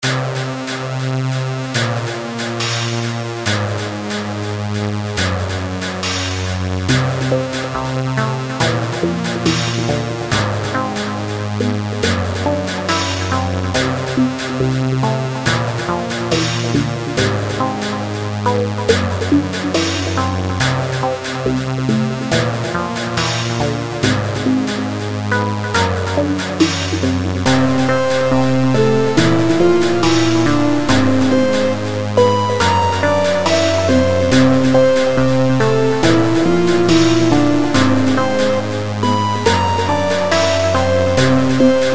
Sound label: Ambient Game Music